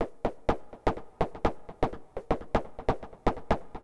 XTRA HOUSE 125

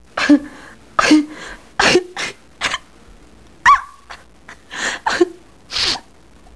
whimper; People; Sound Library 2000; Wayzata Technology

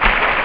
xpld

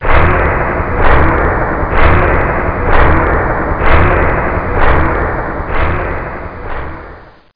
step

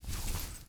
foley cloth short; Earth and Beyond; EA Games

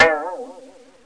Cartoon boing

